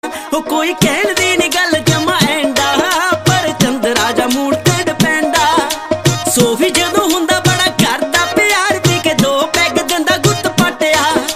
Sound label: punjabi ringtone